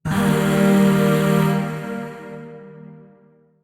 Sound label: Shawzin Blues Chord B; Warframe; Zariman; Void Shawzin; Chords